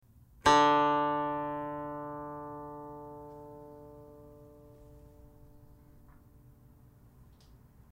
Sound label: guqin; chuYinKouXian